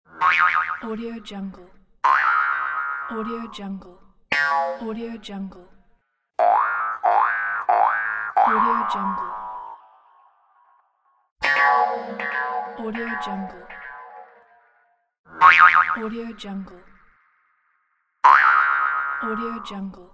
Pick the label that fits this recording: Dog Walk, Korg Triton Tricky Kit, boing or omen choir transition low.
boing